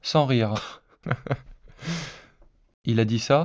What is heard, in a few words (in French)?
rire synthese contextuelle